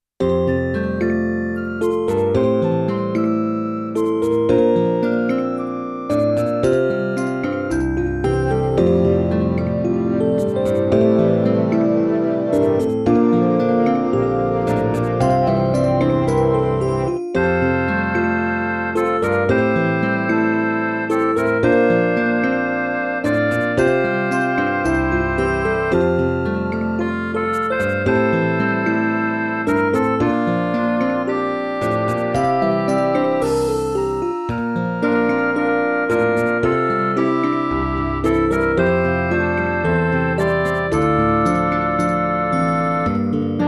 midi

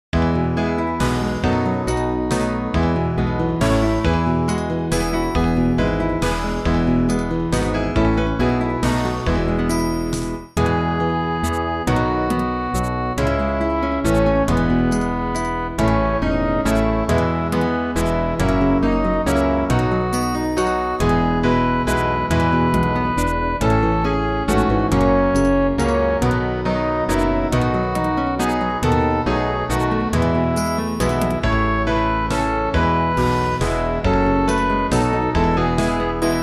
word of the lord midi

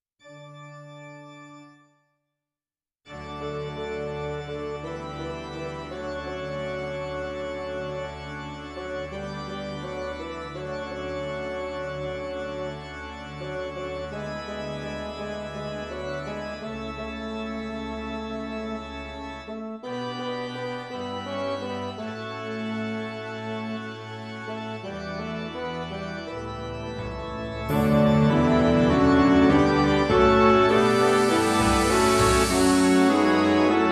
doxology amen midi

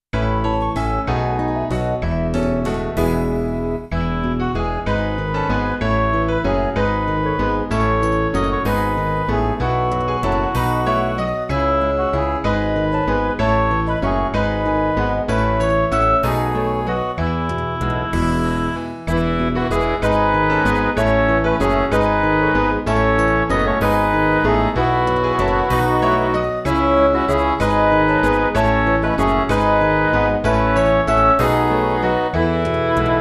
midi

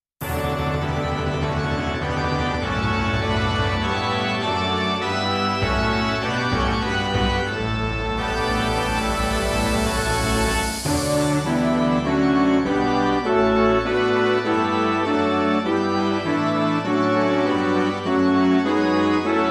holy holy holy midi

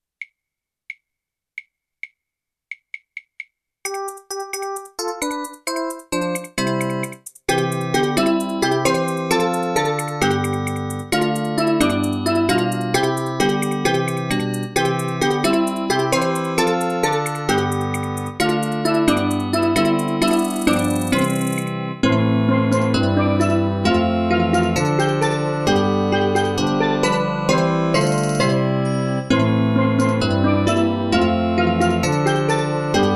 let us talents and tongues employ midi

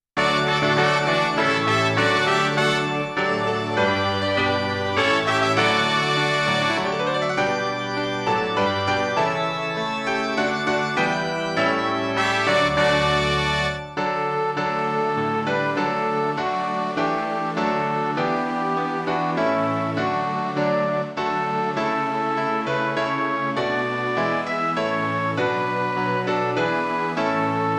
we gather together midi

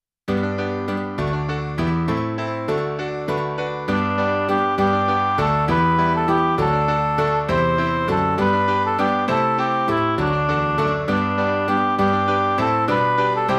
celtic midi

